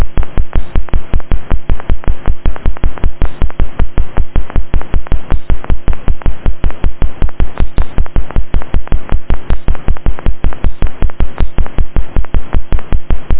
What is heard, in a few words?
firing with current injection